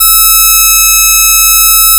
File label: fm voice single2; spectral convergence; FFTimbre